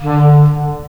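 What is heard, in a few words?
wind clarinet; Roland - LA Composer vol. 3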